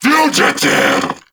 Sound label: Vocal Attack; Warframe; Duviri; Dax